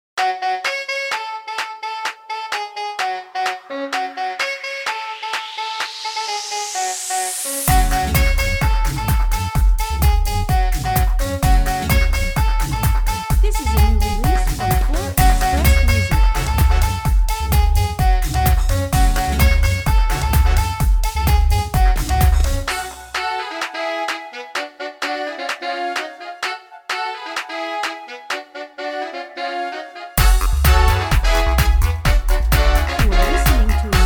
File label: I'm A Drum Machine